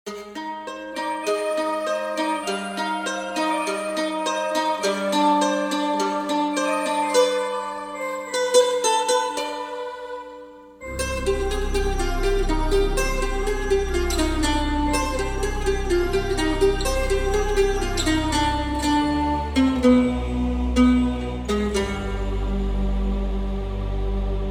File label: house